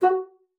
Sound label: bassoon, F#4; Xsample VOL-6